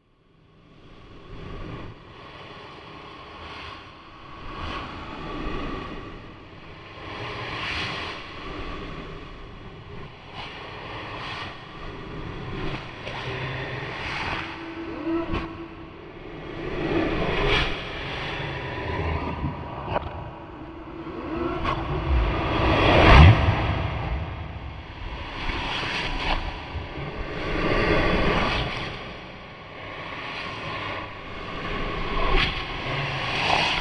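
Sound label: sounds of scary voices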